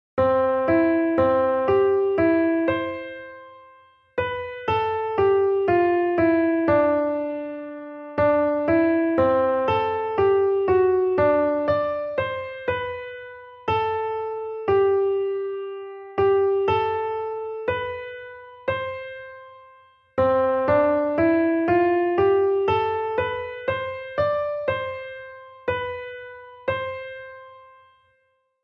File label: melody